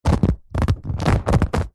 household chair sofa couch leather body movement arm leather creak; zapsplat